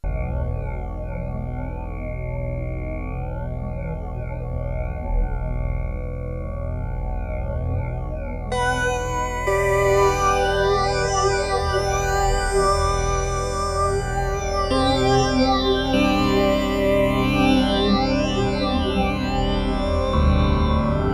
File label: xfade, multiphase; Scott Stites